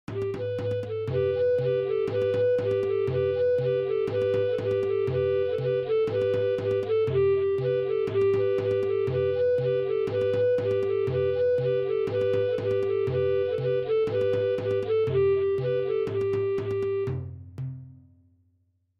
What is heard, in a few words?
Hungarian Tune Recorders